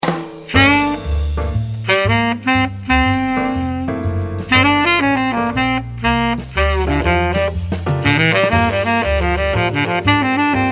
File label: Jazz metal bari; gottsu; Sepia Tone Jazz Metal